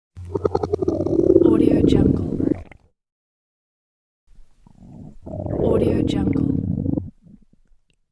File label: hungry stomach